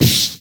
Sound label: punch middle